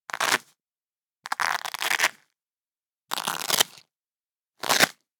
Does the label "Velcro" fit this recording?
Yes